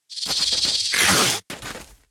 Snake warrior attack; Puzzle Chronicles; PS3; PSP; X360; SFX 3D Snakewarrior Spattack

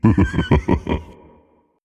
Vocal Laugh Evil Short; Minecraft Story Mode; Colossus

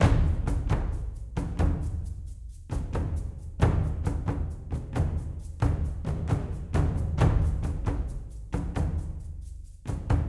Music Battle

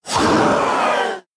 Ghost Pain; The Town; Devour

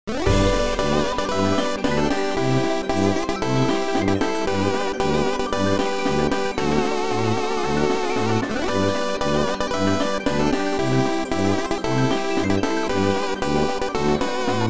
BGM Circus; Part Time UFO; Android

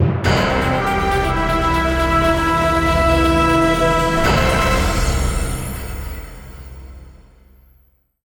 News Stinger Intro; Horizon

